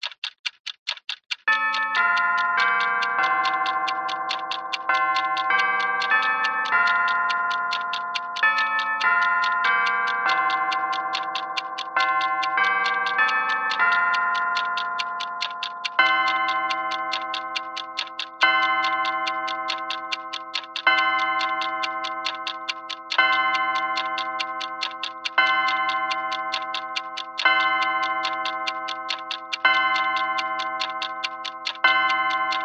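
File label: clock mantle tick tock strike 12 bell westminster chime; household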